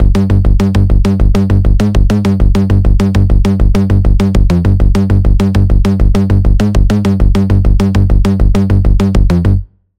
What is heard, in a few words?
Pulse; Routed Sound; Sylenth1 Expansion - Quantum Enforcer Vol.1